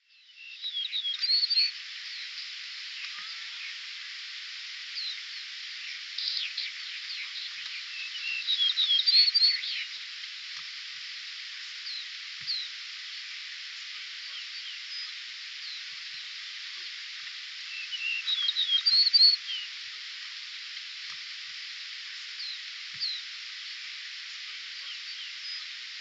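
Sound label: yellow-breasted bunting, sarobetsu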